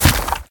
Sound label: sand hit; smite; Anhur